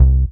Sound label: DM BAS, Dance Mega Synth; Best Service